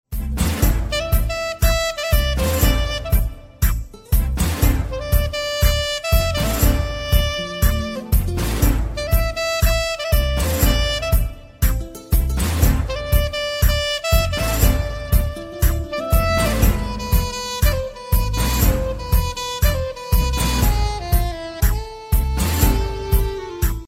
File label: salsa ringtone; samsung ringtones